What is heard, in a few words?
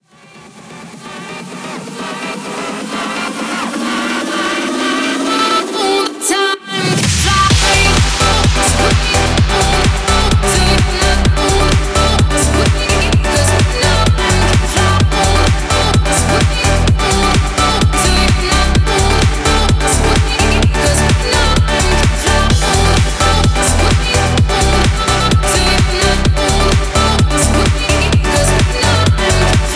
EDM Chorus; 「Chorus（サビ）」部分